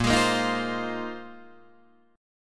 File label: A sharp 9th Suspended 4th A sharp, Guitar, Open D